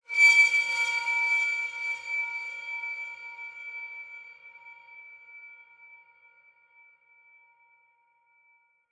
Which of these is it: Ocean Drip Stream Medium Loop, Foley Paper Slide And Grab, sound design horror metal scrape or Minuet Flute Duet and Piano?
sound design horror metal scrape